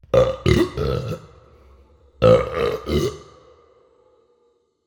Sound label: Forest burp; wheldrake wood